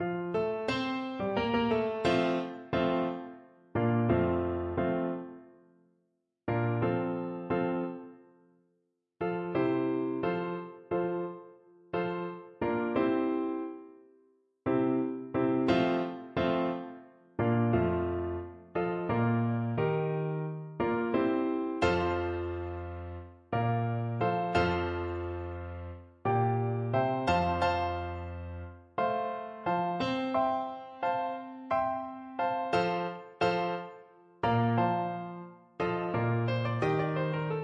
Euphonium

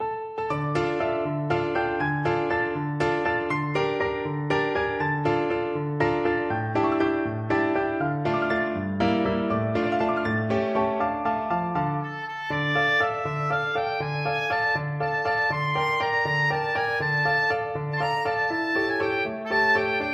terciopelo, oboe

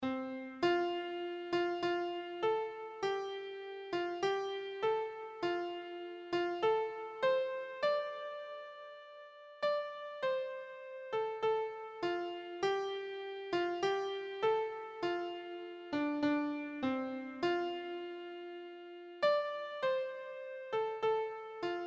auld lang syne keyboard

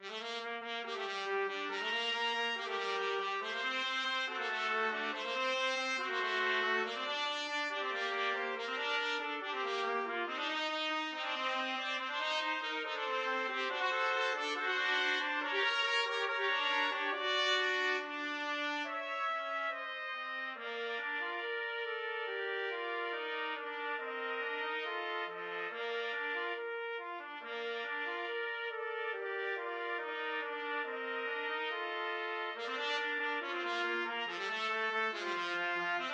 trumpet trio

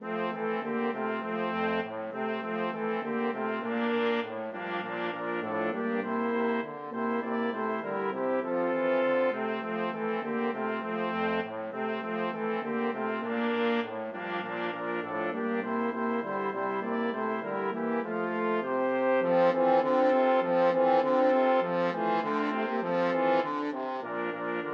jingle bells, flexible brass ensemble 4 players

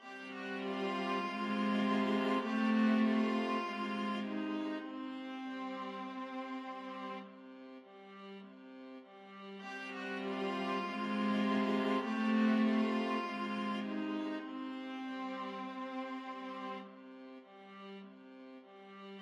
viola duet

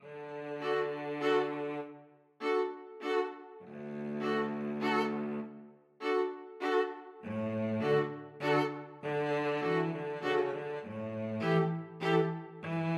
string quartet

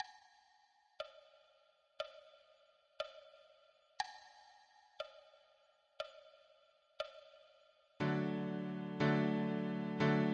viola

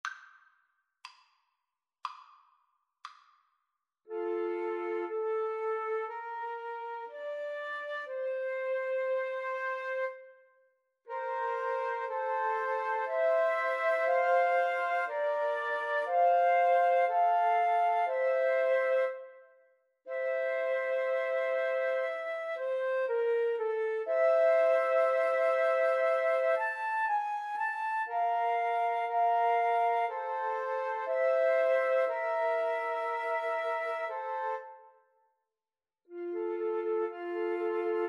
romance, Flute Trio

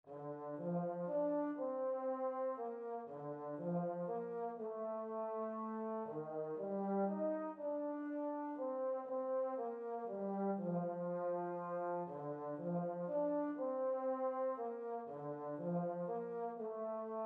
euphonium

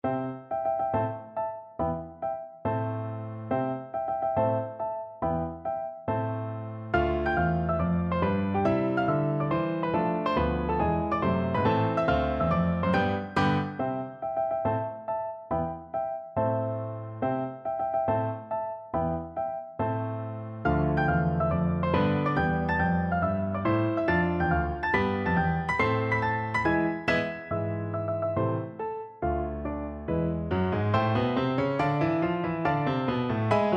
tchaikovsky nutcracker, piano duet

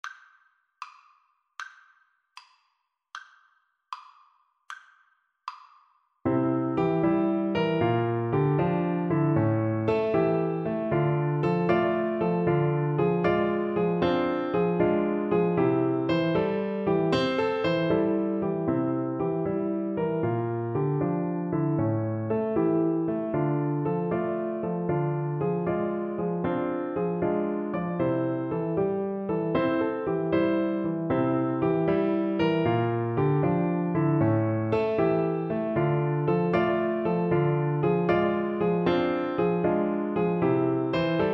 Oboe

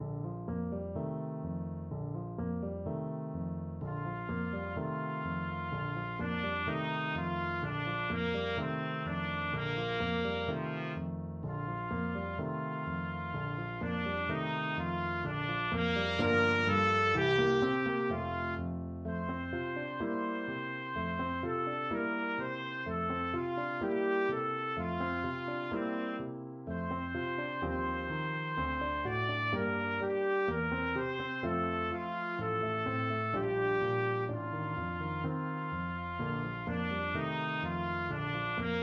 berceuse trumpet; faure

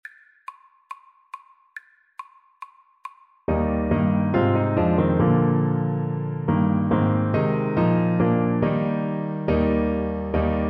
ding dong, Tenor Saxophone

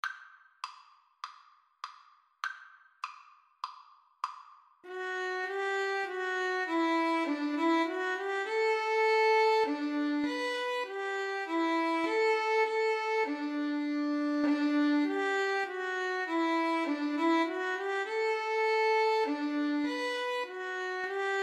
violin duet

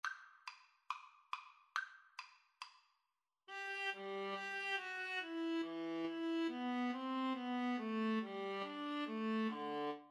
Violin-Viola Duet; Handel, George Frideric - Gavotte in G HWV 491

